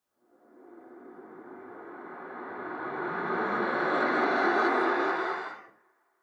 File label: GHOSTS Swell Riser Vaporous